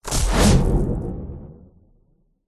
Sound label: sound design cinematic whoosh impact gritty debris